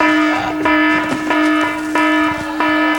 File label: buzz submarine